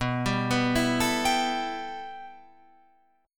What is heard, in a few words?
B 7th Suspended 4th Sharp 5th, Guitar, Open F; B7sus4#5; arpeggiated